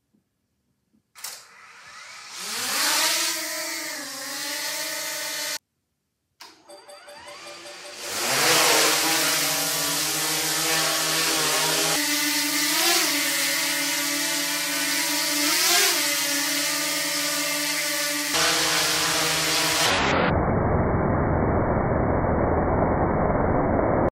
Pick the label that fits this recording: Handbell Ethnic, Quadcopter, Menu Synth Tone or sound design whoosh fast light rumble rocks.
Quadcopter